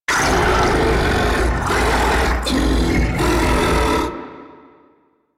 Octopede Idle; Warframe

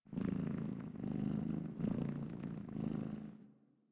Catbrow Kitten Purr; Warframe

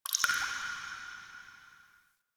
Drips; Ambience; Warframe; Grineer Asteroid

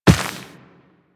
Walk Dirt, Footsteps; Warframe; Operator

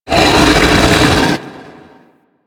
Rock Elemental Cast Vocal; Warframe; Brawler

